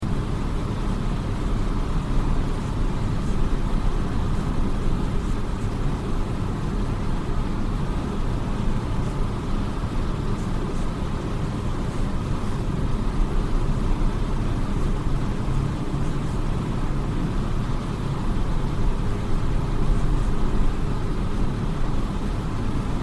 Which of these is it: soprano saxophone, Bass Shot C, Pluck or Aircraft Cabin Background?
Aircraft Cabin Background